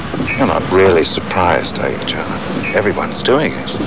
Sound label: surprised